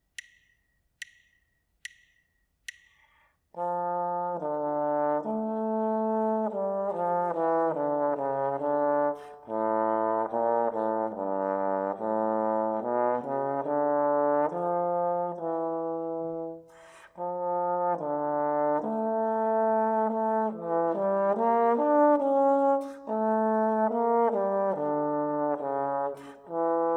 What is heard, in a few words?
melodic dictation; eartraining